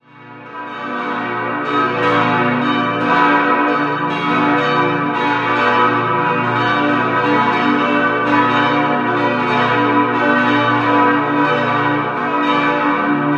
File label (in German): Dachau Maria Himmelfahrt, Glocken